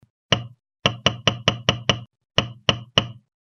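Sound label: knocking sound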